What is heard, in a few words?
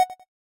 result message; HeavenStudioPlus; rankings